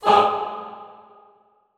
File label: FAH, Extended Classical Choir; Best Service